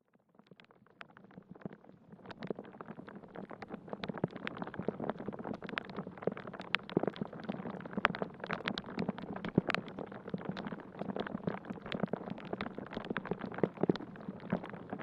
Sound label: Rainstorm Inside Forest; Earth